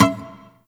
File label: Shawzin Wrong Note; Warframe